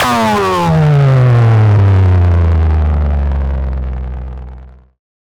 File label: Bass Drop; SoundsCrate